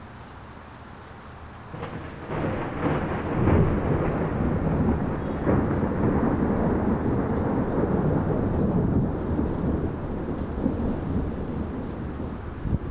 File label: thunder